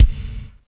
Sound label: CYBER KIT, DRUMS